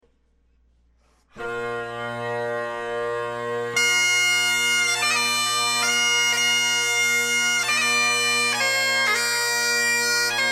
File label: bagpipes